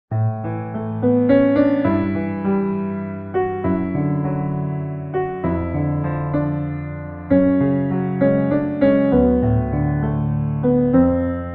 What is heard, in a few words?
piano sketch